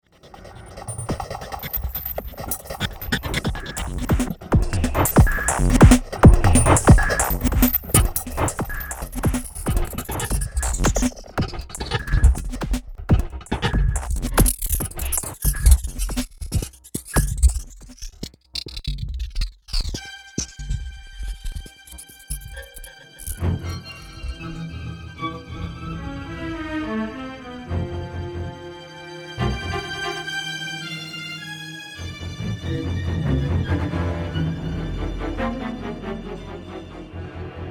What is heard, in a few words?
trance formation